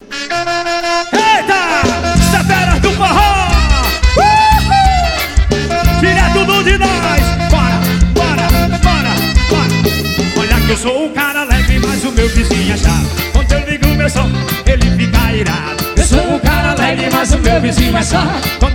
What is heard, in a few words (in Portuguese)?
forró ao vivo